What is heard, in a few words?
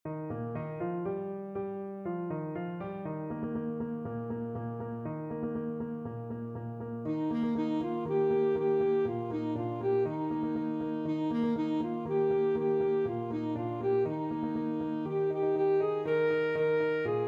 saxophone; ene andi koura